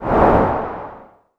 quick air woosh

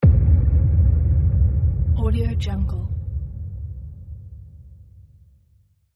dark rumble boom

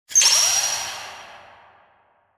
bot mech screech; chamber

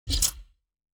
mech stomp squish

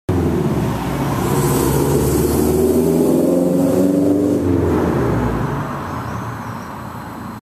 roaring sports car

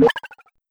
tech break fail

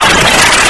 scary creepy

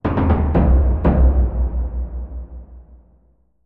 drums of war call

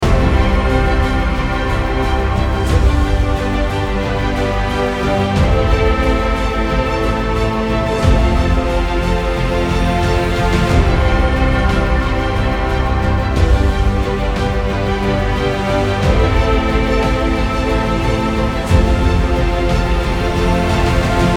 inspirational epic

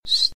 whistle scratch

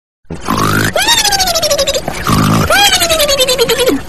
goofy ahh snore

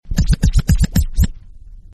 scratch dj

